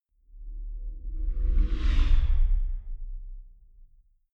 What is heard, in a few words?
sci-fi vehicle drive by metal soft; raumschiff